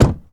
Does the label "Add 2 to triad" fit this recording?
No